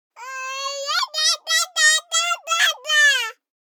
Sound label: BABIES SHOUT Girl 12 months Yadadadada; Baby Boom